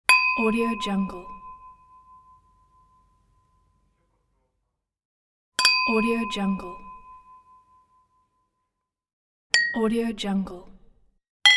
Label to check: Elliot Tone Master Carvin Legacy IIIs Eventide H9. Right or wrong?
Wrong